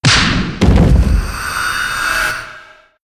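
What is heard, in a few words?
giant cheshire death, monster; Senillinea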